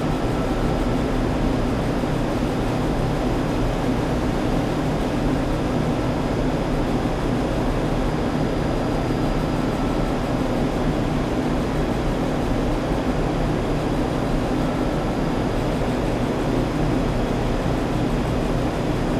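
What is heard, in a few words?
ambience computer fans; boneyard; Titanfall 2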